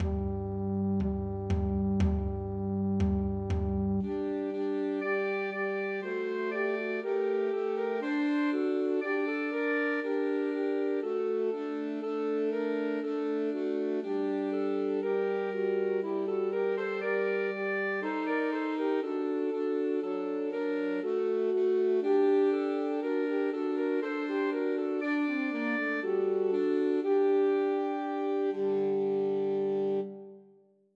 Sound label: Pat a Pan, Christmas Trios for Three Alto Saxophones